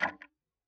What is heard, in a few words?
Tick, Clocks Kitchen Clock